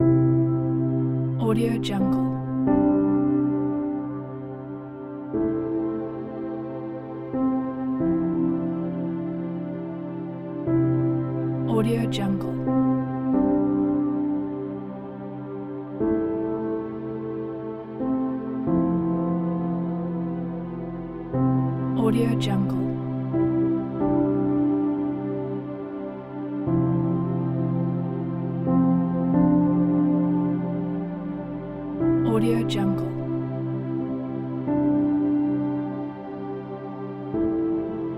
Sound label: sentimental score piano